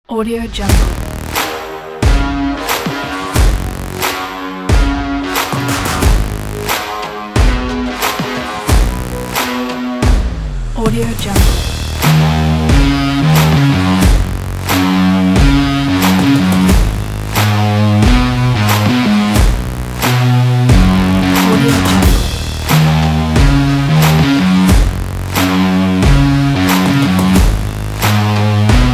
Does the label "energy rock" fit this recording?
Yes